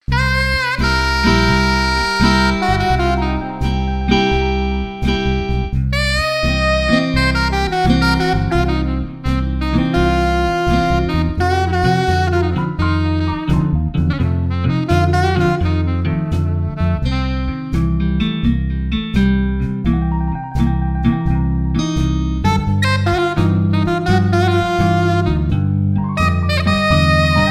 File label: heptatonic; blues